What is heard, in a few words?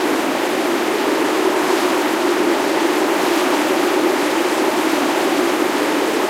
Wind Tube Thing; Game rip; MiSide